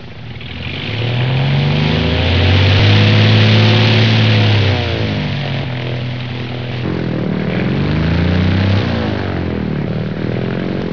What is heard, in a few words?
cessna